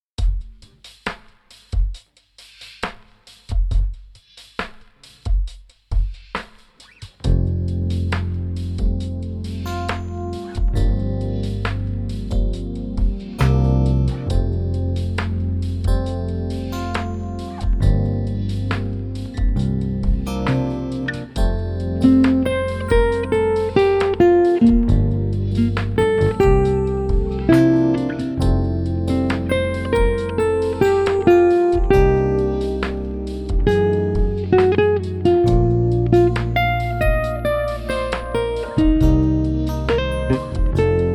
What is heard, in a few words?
F Ballad Nylon